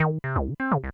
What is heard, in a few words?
fastsong